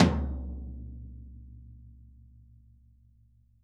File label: VINT KITS; Sonic Reality - Vintage Timetraveler